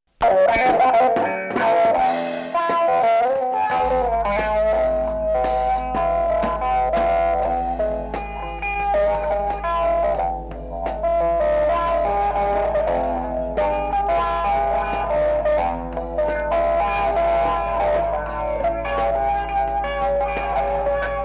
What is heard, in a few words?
Improvisation